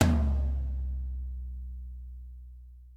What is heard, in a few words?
Hi Tom Drum One Shot B Key, Royality free tome drum sample tuned to the B note